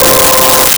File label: Polysynth C5; Nintendo; July 2020 Gigaleak